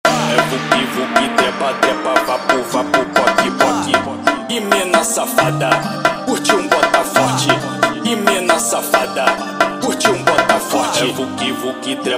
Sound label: Extremely Sad Funk